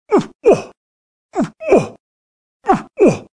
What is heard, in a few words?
Running Grunts